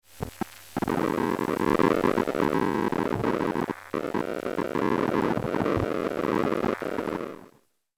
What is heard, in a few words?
Laptop glitches and noise